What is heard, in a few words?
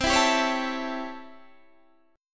C 6th Flat 9th C; strummed